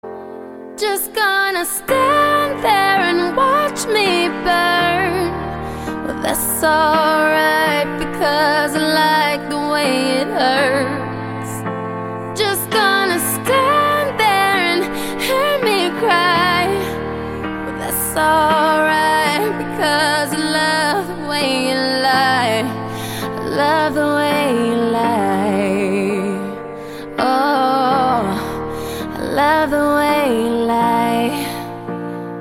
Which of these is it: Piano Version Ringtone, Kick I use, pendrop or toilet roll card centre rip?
Piano Version Ringtone